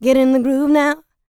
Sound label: licks, vocal, dance; ILIO; Vocal Planet